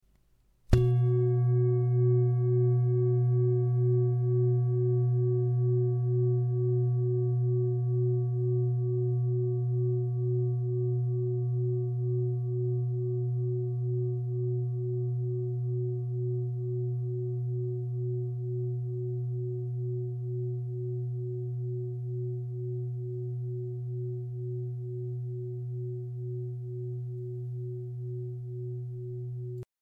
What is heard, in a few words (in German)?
Klangschale